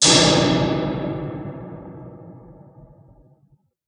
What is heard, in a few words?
metal hit